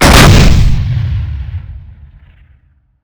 105mm shot

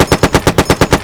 m3 loop; WAR

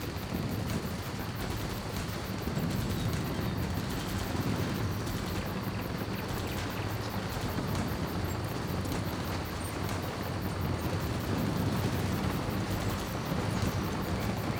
in battle more river; WAR

